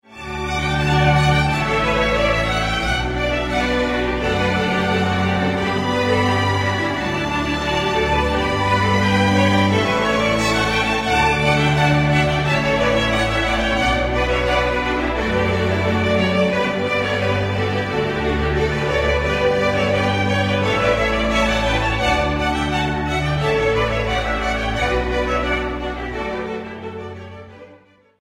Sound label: Canon in d major